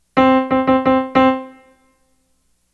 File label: rhythm recognition